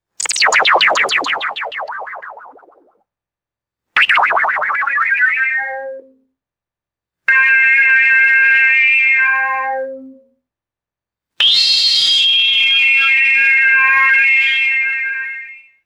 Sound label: buzzy phasey synth tone bursts and sustains; magic-scifi